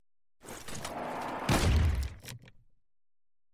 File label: canon